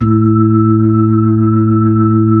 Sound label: B-3, Blues, Keys of the 60s and 70s